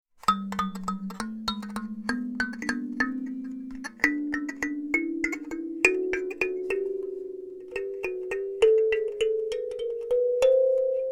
Chromatic Alto, kalimba

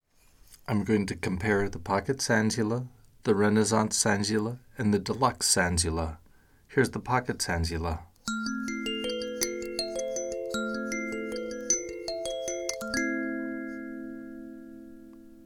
SANSULA COMPARISON

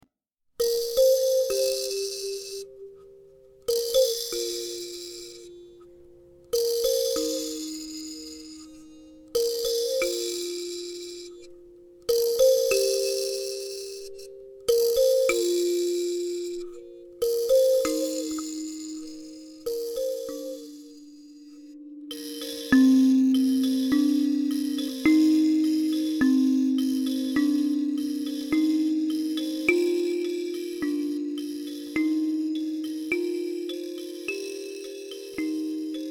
Lotus Box Buzz, kalimba

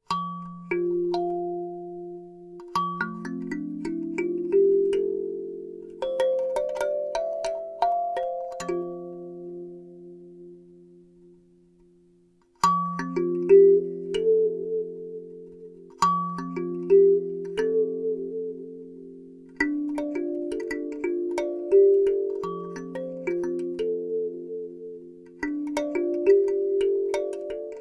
Karimba Box F 15

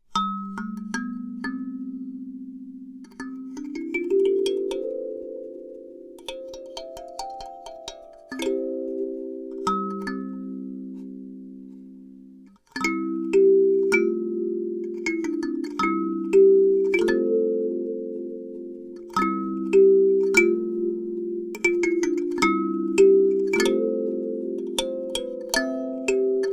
C Alto, kalimba

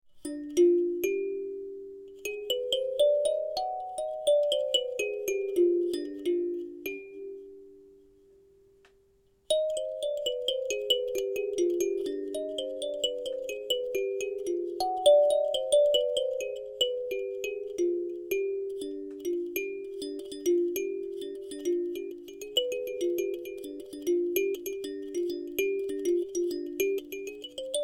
Min 9 Mid Eastern, kalimba

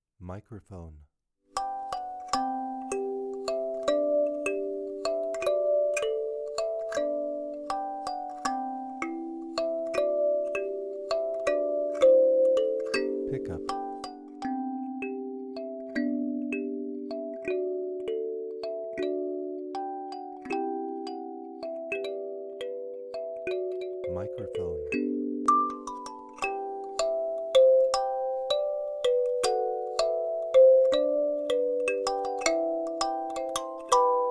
Treble, kalimba

